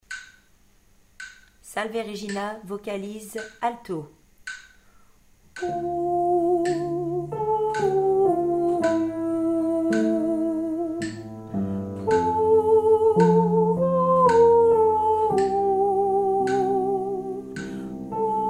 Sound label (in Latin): salve regina vocalise alto